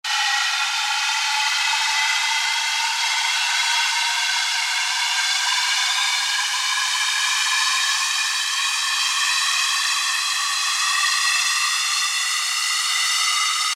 Noise FX Loop 140 BPM; Tooloose; revealed fx loops vol 2

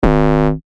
Bass Shot G#; synth shots tech house; Circus; revealed

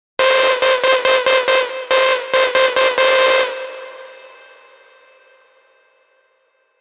Screech, Sylenth1 hard techno; Hysteria; revealed sylenth1 hard techno vol 1

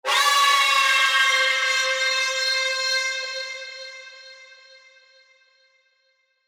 Distant Scream; revealed halloween horror 2025 edition

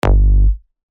bass shot G; Triumph; revealed bass shots vol 4

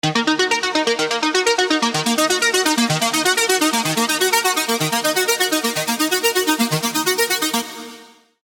Serum arps; 50 Shades Of Grain; revealed serum arps vol 1

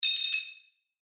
Stormwatch, percussion; revealed percussion vol 3

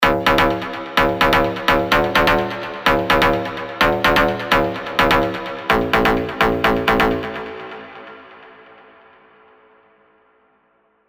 Stab; Stab Asylum; alonso 18 year anniversary pack; Spire

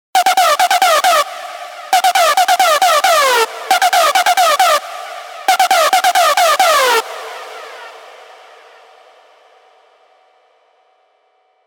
Screech; Screech Raptor; Revealed Serum 2 Big Room Techno Drops Vol. 1

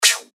FX Hat, cymbals; revealed cymbals vol 1

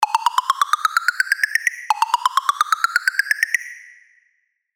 LD Big Bad Perc, sylenth1 dirty dutch leads; revealed sylenth1 dirty dutch leads vol 1

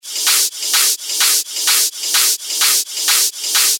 128 BPM; Revealed Top Loops Vol. 4

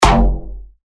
F#, bass shots; Caffeine; revealed bass shots vol 3

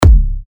TECHKICKS C#, techno kicks; Icebox; revealed techno kicks vol 10

